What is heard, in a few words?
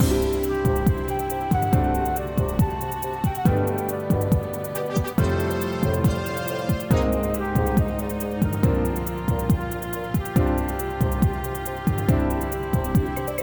bgm